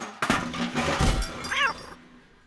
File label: cat; Mafie 1